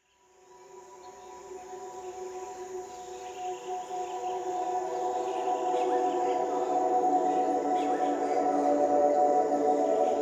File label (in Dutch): Ontspannende Muziek Voor Kinderen, Vogels